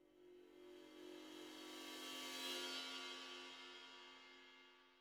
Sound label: Perc